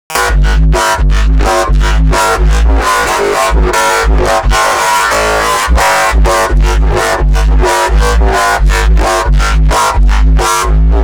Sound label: hz bass